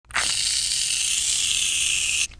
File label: hair mousse